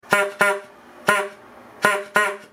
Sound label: single loop, squeeze horns